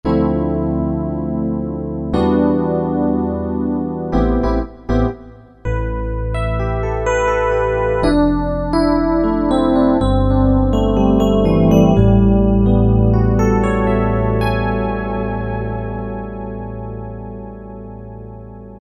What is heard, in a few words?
DWGS EP, Korg 05RW